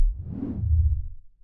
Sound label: expand; gnome-audio-k1; panel